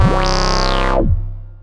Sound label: Synth (low oww; Sonic Foundry (Sony Creative Software); Ugly Remnants VOL-2